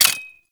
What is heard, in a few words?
Fire Stop Mech; Weapons; Gotva Prime; Warframe